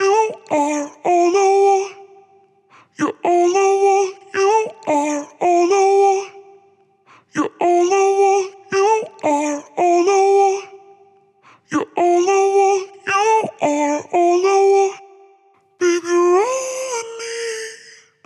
female syrup WET; metapitch

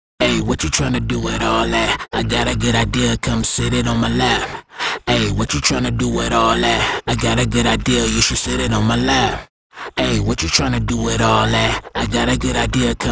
male subtone WET; metapitch

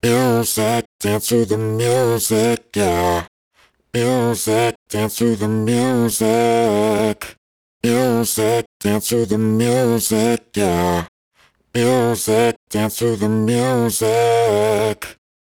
female bass support WET; metapitch

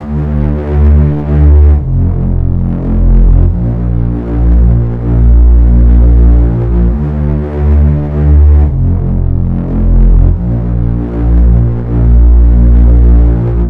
Synth Bass Wet

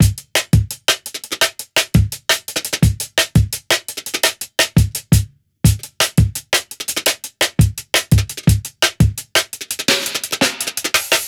Breakbeat Drums Dry

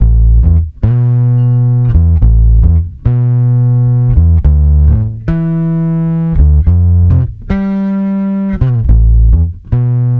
E. Bass Wet

